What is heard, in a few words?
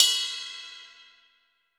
Pop Ride Bell, Roland BK-5 Cymbals